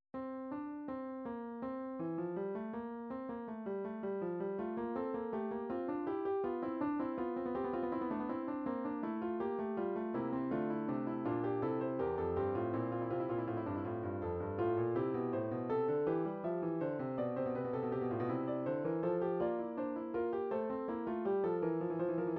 classical fugue, midi

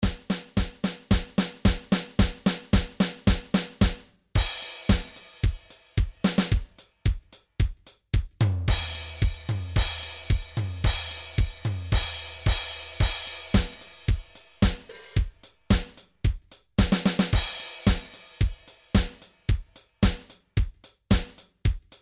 midi drums

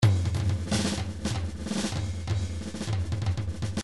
drums 125 fills; Paul Kodish Dangerous Drums

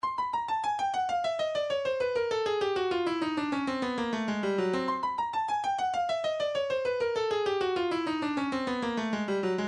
percussion midi djembes 099 djembe; Stylus

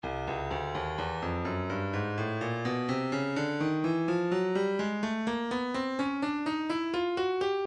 breakbeat midi 063 bpm; seep c; Stylus Breakbeat Midi Files

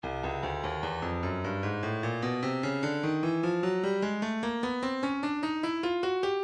breakbeat 075 bpm; Stylus Breakbeat Midi Files; slip knot b

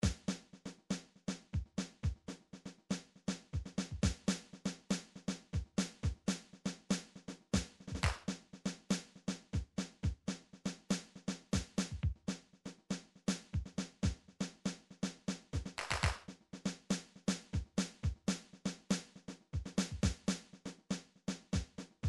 drums

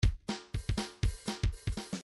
midi grooves pop

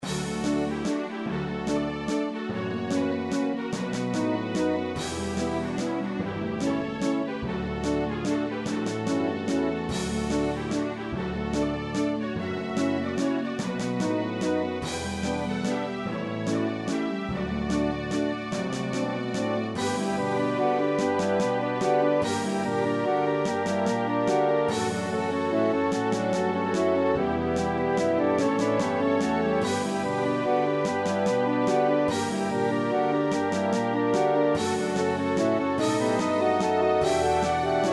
orchestral mix; nintendo river city ransom password theme

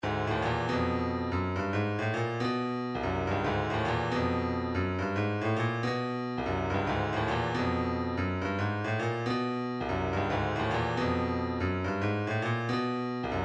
vocal planet reggae, midi

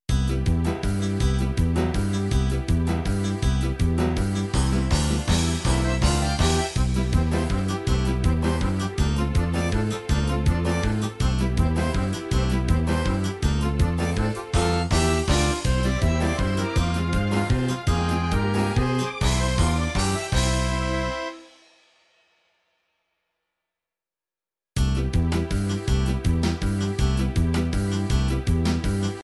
polka, midi

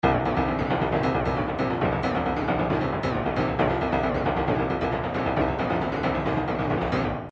trance midi loops bass; newtronic trance constuction kit

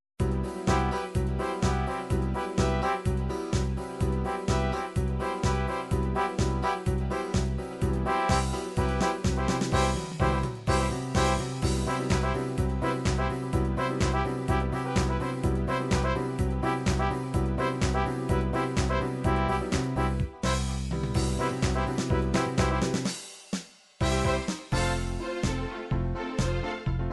disco 70s disco, midi

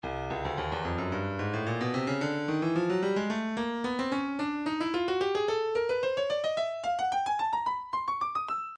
breakbeat 110 bpm; booganu c; Stylus Breakbeat Midi Files

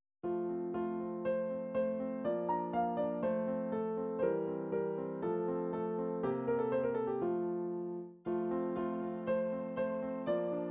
classical twinkle, midi

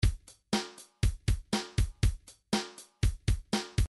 rock 2 bar, midi

